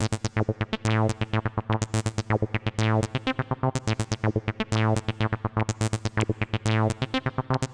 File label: 124 Dance Pulsing Synth Amin; Dance DJ; Digidesign; Pro Tools Essential Loops